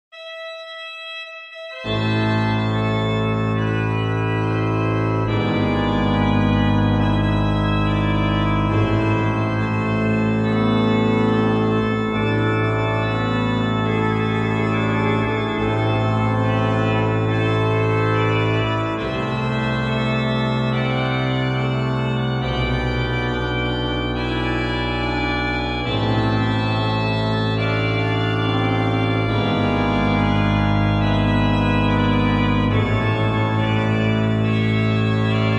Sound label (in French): Kyrie Plein Jeu, OrganMusic; Beauvarlet Charpentier Journal; Souvigny